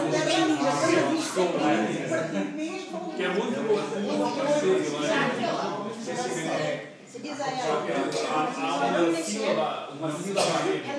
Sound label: still eating dinner sigh